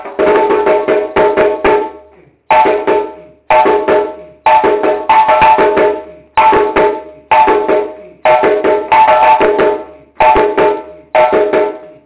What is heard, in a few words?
Tiriba Solo